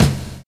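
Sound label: SAX N GUITAR; Univers Sons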